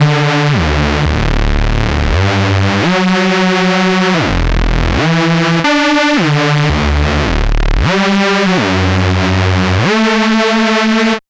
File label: dubsteppy, Electronic Loops